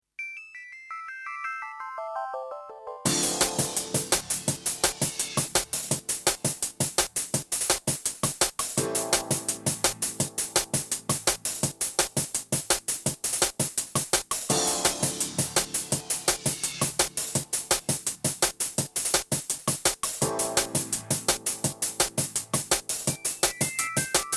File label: Urban Jazz